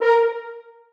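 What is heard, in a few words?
horns staccato a#3; Sonatina Symphonic Orchestra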